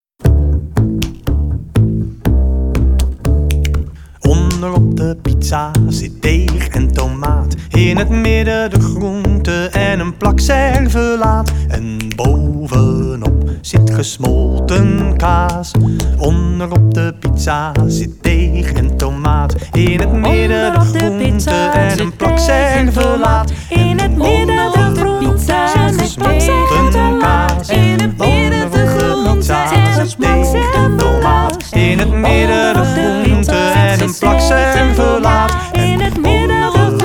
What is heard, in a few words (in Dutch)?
pizza canon